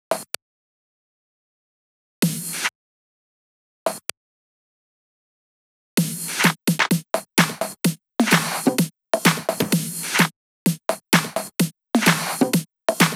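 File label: Drum Loop 128BPM hi-hat Perc; VEC5 Drumloops; VEC5 Multi Loops 128BPM; Loop Elements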